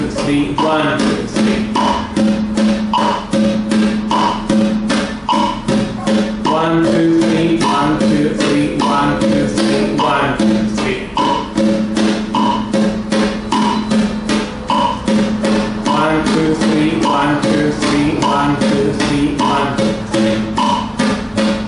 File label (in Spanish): Rasgueo, guitar